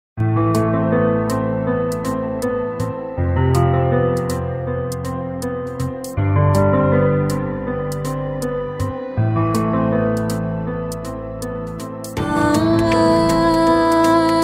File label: Instrumental Violin